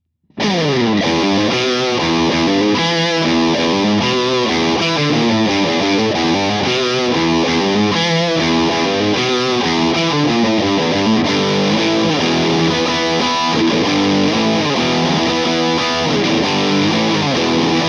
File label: characteristic sound; C12K-2